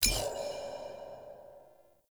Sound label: Shard Slot Focused; DE; Warframe; Helminth Shards